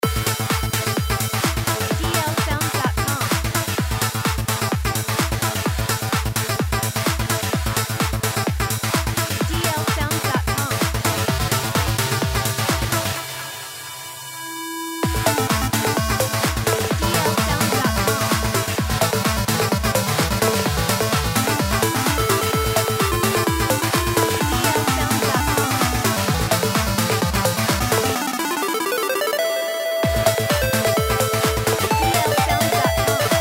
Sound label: Gameplay Beat Loop